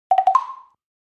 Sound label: Percus Plastic Block, percussion blocks